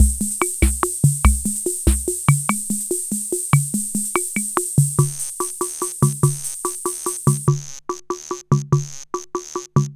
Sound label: R77 Rock & Cha 6 8; rhythm77